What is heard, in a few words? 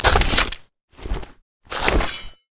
emote laugh; masteryi base